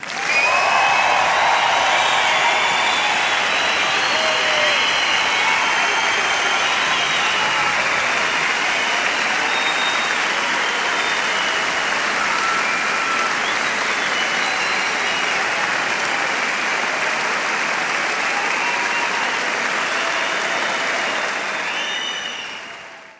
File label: Crowds; Vengeance Samples; VEC2 FX